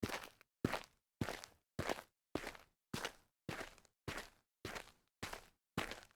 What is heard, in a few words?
dress shoe walk light grit